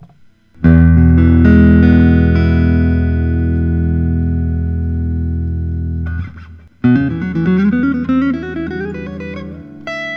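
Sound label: fender stratocaster 3 tone sunburst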